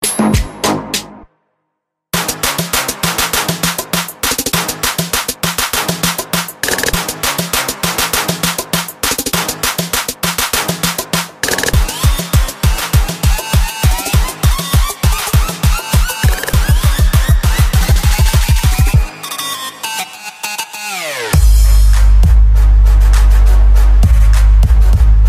Trap arabic

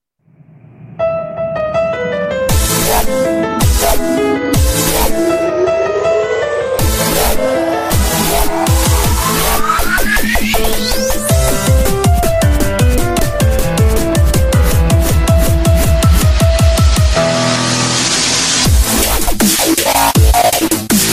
Crazy Dubstep

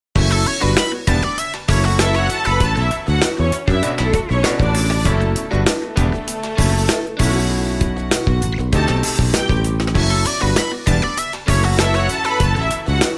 Cinematic Tale

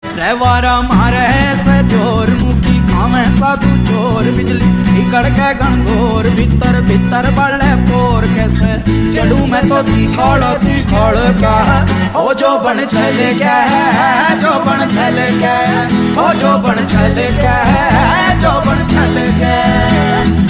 Unplugged

